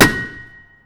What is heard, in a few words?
piano sound